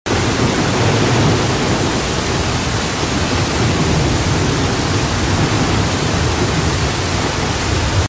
sea and Rain

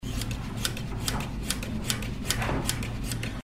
carpet weaving; قالی بافی

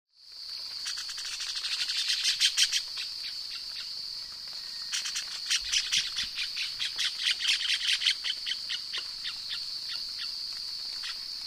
Puff throated bulbul

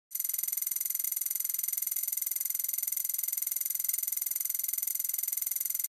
Digital text

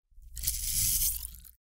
ampoule, آمپول زدن

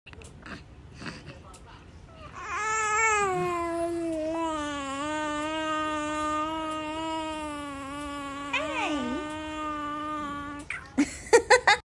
Baby yawning

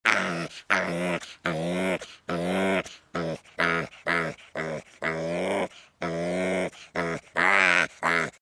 Pig Sound

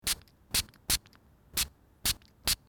foley squirting the atomiser of a perfume bottle

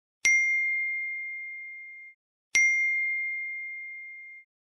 iPhone message ding

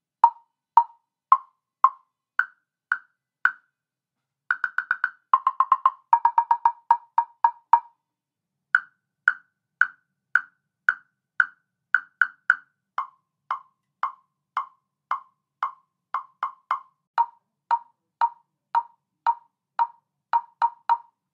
temple blocks

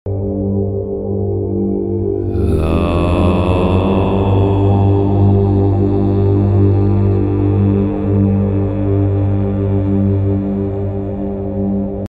Root Chakra Music; موسیقی چاکرای ریشه

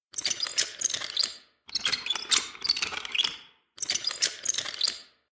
Wheelchair; ویلچر

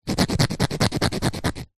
nail file metal filing nail

